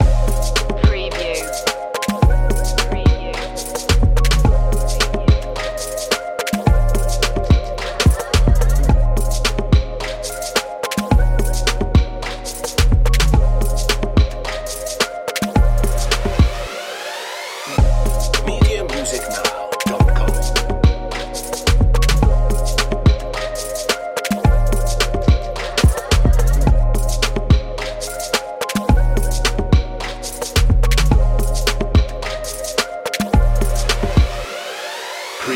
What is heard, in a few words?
hip hop loop